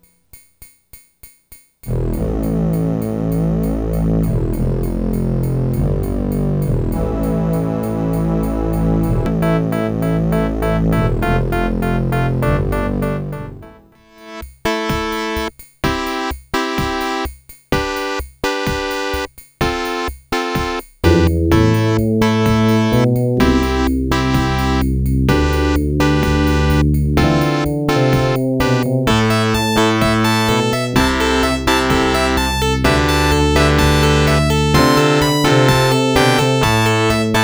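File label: Intro SB AW16 SpeakerOut, Sound Blaster; raptor; Crystal 3D Sound Card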